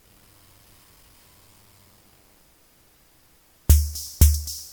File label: noise, drumstar